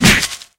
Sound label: hit